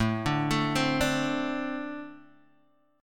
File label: A Minor Double Flat 5th Guitar Open G